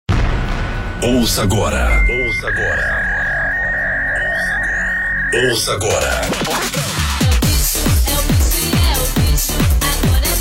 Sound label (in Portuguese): Batida Dance; Nostalgia